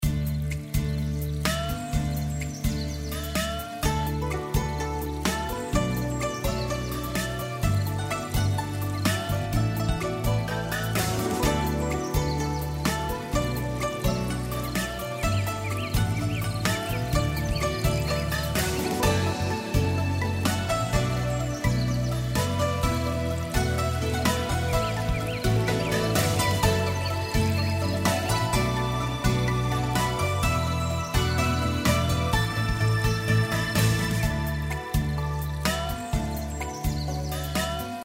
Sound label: background music; Holy Goat